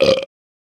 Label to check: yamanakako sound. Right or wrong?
Wrong